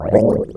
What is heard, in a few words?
gurp; opentdm; Zumlin